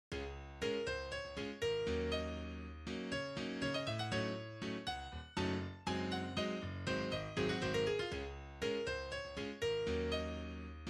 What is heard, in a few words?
groove